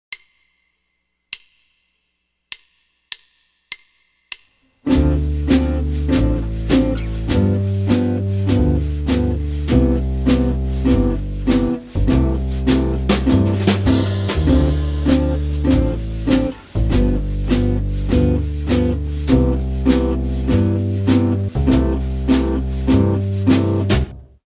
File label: 2 5 1 C Major, jazz guitar